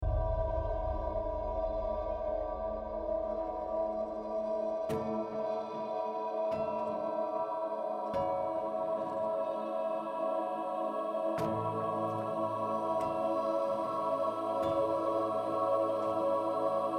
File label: Swing